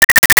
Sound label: Arcade Chirp; Arcade and Games